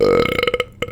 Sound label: burp